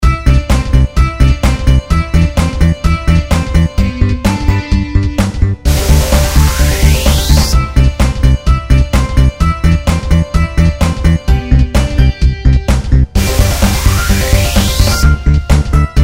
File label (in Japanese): 明るい; 激しい